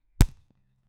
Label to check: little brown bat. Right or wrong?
Wrong